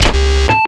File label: Mode Switch